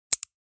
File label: Pen office single click